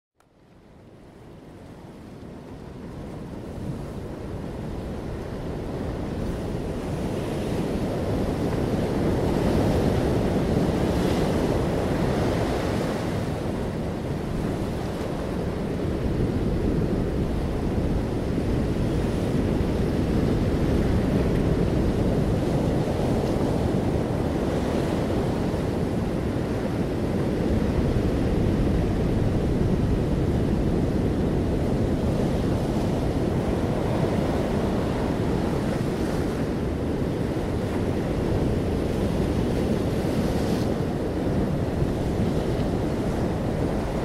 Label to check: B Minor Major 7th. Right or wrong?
Wrong